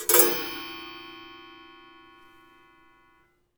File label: Springs, cartoon Foley Fx; musicradar; cartoon caper